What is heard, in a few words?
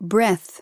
breath